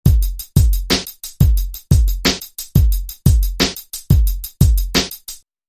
Trill Drum Loop

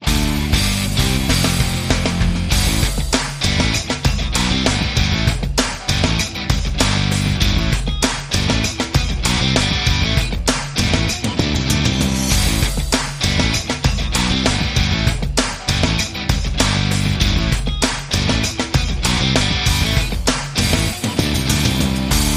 World View Promo

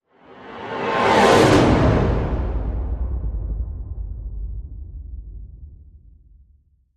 fly by hornet

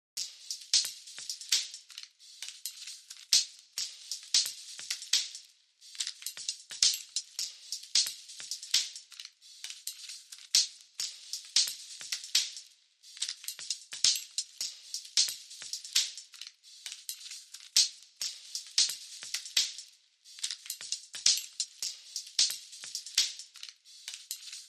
Beats Only

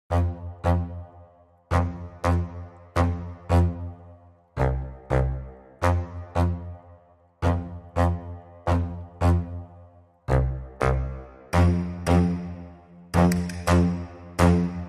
Accompaniment Only

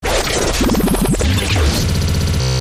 Chaos Down And Buzz

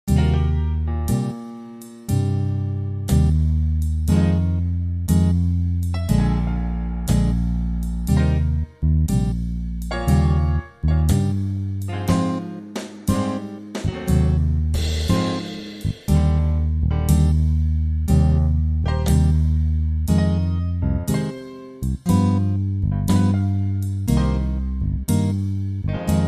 Auld Lang Syne Sloppy; Trad (PD)

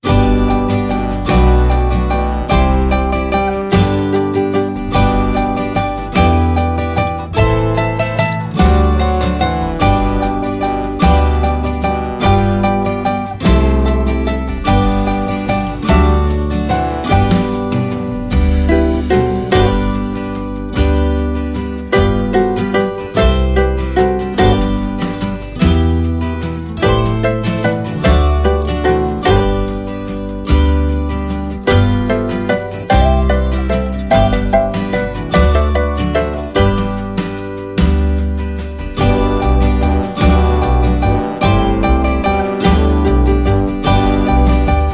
Chicken Skin Waltz

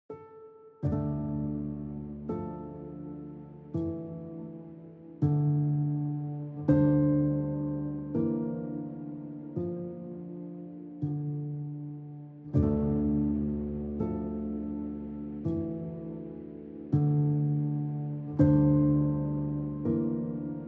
Drums Piano and SFX Only

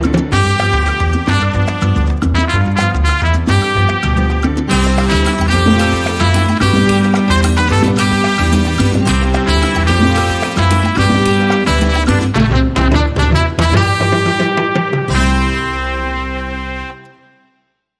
Midnight Rhumba

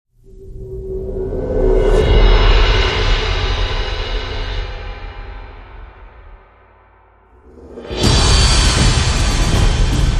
Drums

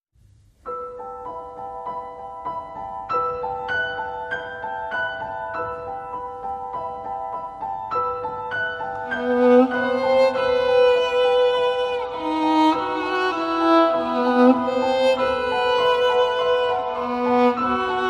Piano & Violin

